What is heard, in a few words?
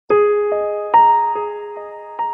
Smooth Msg Tone